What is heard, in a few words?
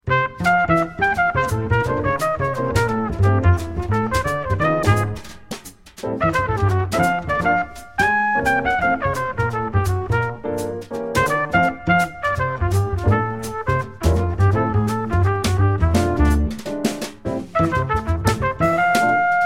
quartet mix